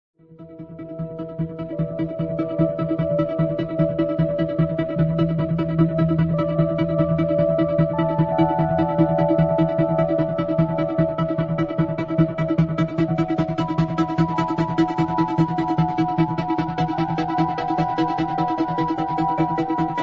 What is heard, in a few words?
Alpine Meadow